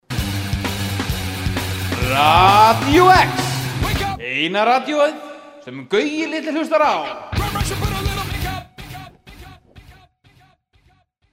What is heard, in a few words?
jingle; Radió-X